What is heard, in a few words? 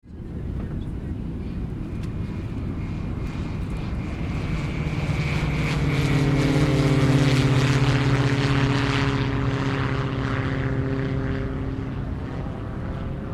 Airport of Johannesburg light aircraft pass by